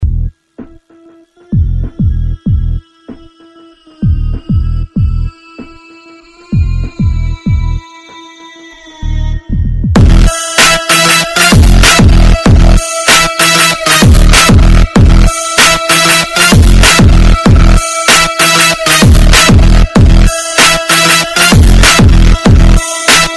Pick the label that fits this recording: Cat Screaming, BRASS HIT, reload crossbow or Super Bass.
Super Bass